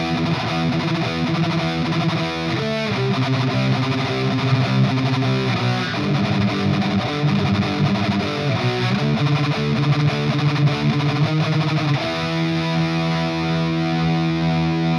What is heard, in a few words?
EL84, guitar; GR56 EL84 PASVAN LEAD; GrandMeister Deluxe 40